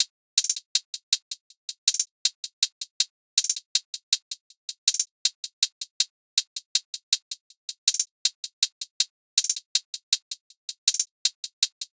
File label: hats 80, Hat Loops; drum sample pack; SEISMIC; Slate